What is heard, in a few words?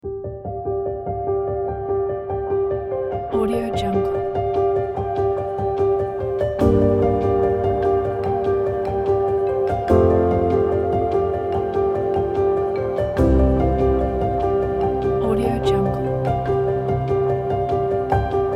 documentary; joysound